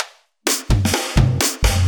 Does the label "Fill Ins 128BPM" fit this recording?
Yes